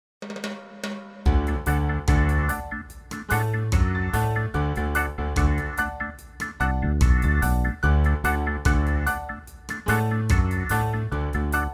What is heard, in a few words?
no accordion